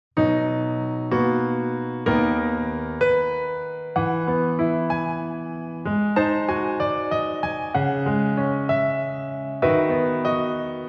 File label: piano